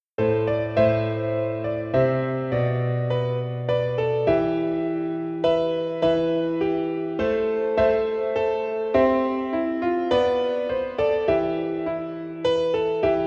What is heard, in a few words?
piano